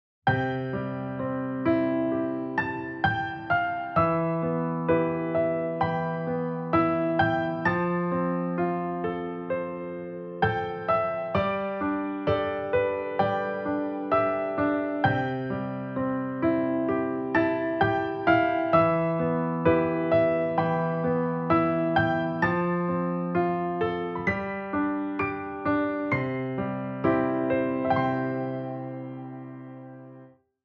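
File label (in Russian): успокаивающий романтический и красивый лирический фортепианный рассказ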